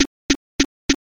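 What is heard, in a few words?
footsteps march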